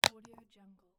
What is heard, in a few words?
dvd box close